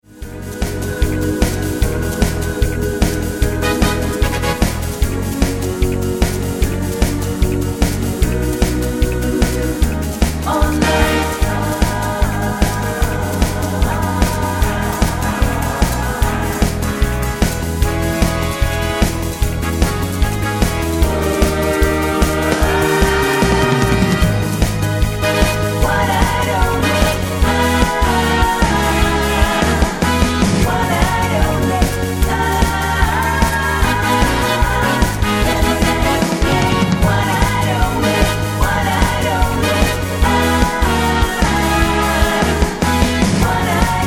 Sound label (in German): mit Chor